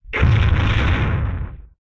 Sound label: Explosion; MMRetroArcadeSoundsPack1 0 5